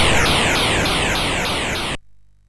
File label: sid MOS6581R2; hvsc